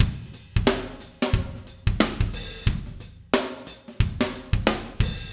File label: 090 Shuffled Rock Drums Verse; Pop Rock; Moderate Tempo; Pro Tools Essential Loops; Digidesign